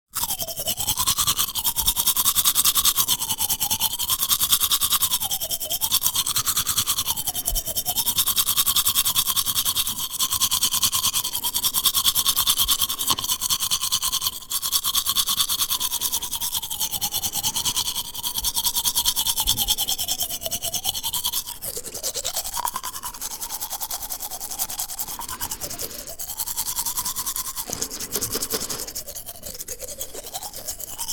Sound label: human brushing teeth; sound effects one